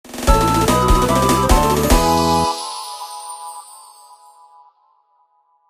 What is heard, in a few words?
stinger key item big; sea of stars ost; solstice